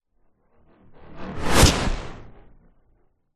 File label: sound design whoosh growl synth smash hit; zapsplat